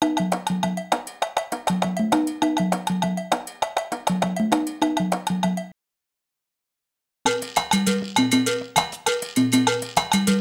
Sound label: Salsa Picante; Masterbits; World Zone VOL-3